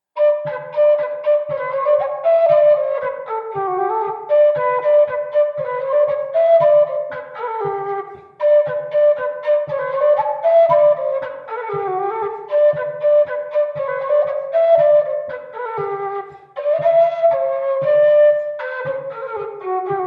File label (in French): Bourree; Recueil De Raoulx